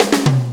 4-4 Fill, Drum Loops

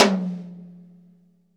TOM, GM drum set

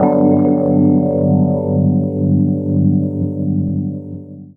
Chords Tones; Equipped Music; Loungin House

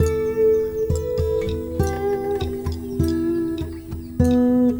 Guitar And Synth

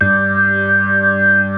G2 SLO POWER, POWER B3; Northstar - New Gold VOL-1

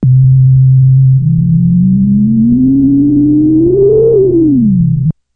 MG1 loop, Moog; Rubber Chicken Software Sample Bank

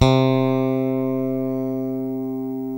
JAZZ, JAZZ BASS

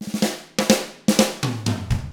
127 Drums

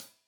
Hat Loose, Hi-Hat, Cymbals Stereo

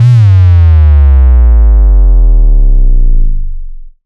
Sub Kicks, Bassdrums; Vengeance; Essential Clubsounds Vol.4

